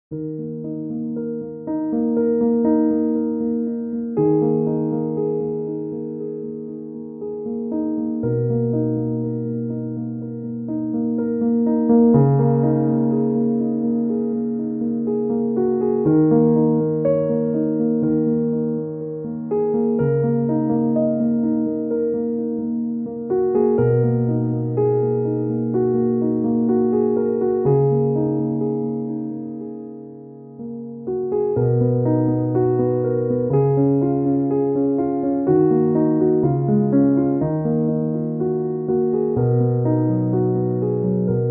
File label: Clean Piano; piano orchestral